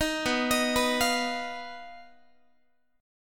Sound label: B Major Flat 5th B Guitar; arpeggiated